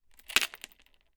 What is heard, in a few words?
household door security chain let go